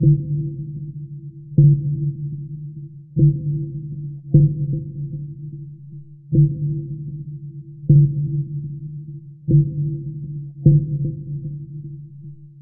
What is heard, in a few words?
Spectral Tones; Sonic Foundry (Sony Creative Software); Loop Noir